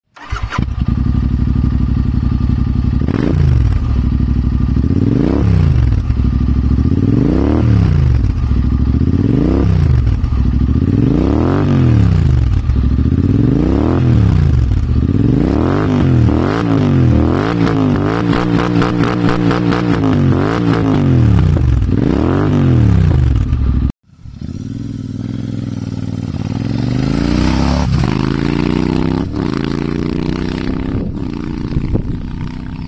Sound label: Long note